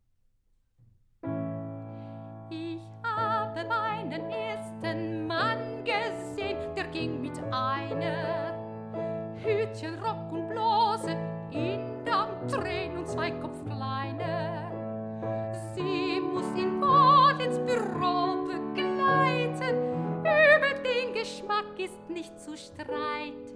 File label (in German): Lieder; Eisler; Tucholksy